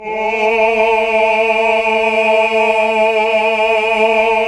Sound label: Greg. Basses; Sound & Vision - Gigapack II CD 1 (Roland); HAL Greg. Basses